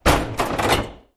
Axe Hits Door Heavy Wo

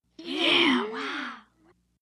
Crowd Kids Booing

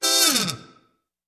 Music Effect; Various Trumpet Jazz Hits.

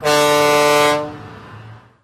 Boat Horn, Honk, Full

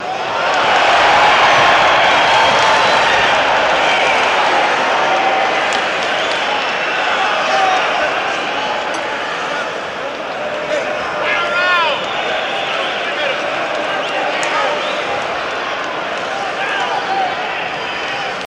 Crowd Hurrah Reacts, big arena

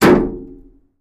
Heavy Metal Impact

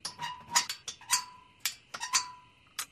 Metal Gate Open

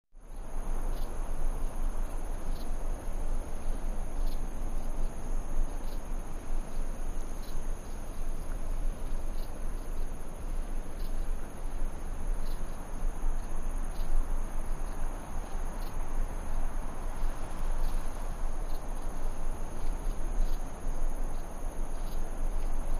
Desert Day - Wind, Crickets, Birds

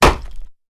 Large Piece Wood Hitt, hits

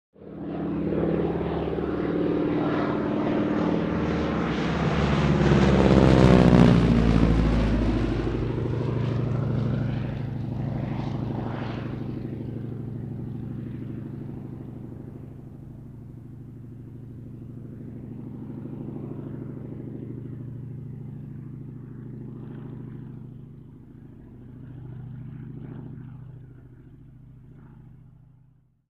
Prop Plane; Fly By; Harvard; Harvard Fly Past. Not Much On The Approach.; harvard ext fly-by

